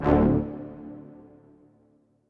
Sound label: speech add